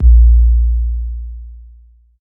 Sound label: tr 808 kick a sharp key; kick drum samples